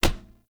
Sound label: Unarmed Punch Impact Metal; Weapon Impacts; Player; Environment